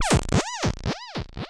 Rubber Duck, Effects; Ableton; Creative Extensions; Poli

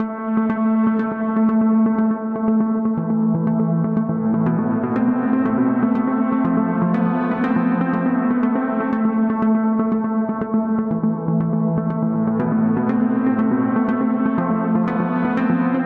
Fatigued Arp - Amin 121 bpm; MIDI Clips; Tonal; Motifs; Ableton; Factory Packs; Mood Reel

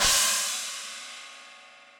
China Direct Natural, Cymbal; Drums; Drum Hits; Standard Hits; Session Drums Studio

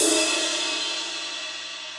Ride Ambient Loud Punch; Drums; Drum Hits; Ableton; Session Drums Studio; MPE Hits

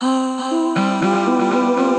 Hocketing Voices, Transformed Voices; Voice Box; Chromatic Voices

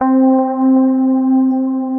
Synth Keys; Theft By Rhythm (Split)

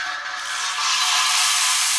Dragging Metallic Rods; Instruments; Dark Textures; Ableton; Mood Reel

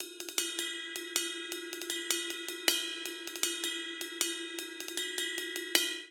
Twist Cymbals Only 157 bpm; 4 4; MIDI Clips; Ableton; Factory Packs; Drum Booth

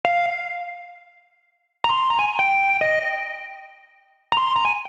EP F Minor 83bpm; MIDI Clips; Melodic; Bari Dust; Ableton; Factory Packs; Chop and Swing

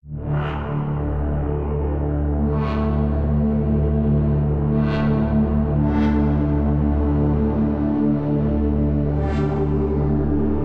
Magnificent Pad - Dmin 108 bpm; MIDI Clips; Tonal; Atmospheric; Ableton; Factory Packs; Mood Reel

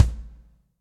Kick Felt Cold Tight Room; Drums; Drum Hits; Standard Hits; Ableton; Session Drums Studio

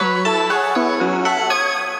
Harmony Arp, Transformed Voices; Chromatic Voices; Ableton; Factory Packs; Voice Box

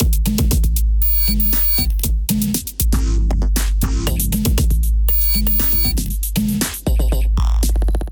Kit groove 118 bpm; MIDI Clips; 89 - 120 bpm; Pan Sonar Kit; Ableton; Glitch and Wash

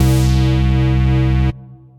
Rave Class Stab, bass; Ableton; Skitter and Step

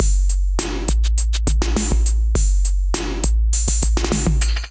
Kit groove 102 bpm; MIDI Clips; Mixed Overseas; Ableton; Drum Essentials

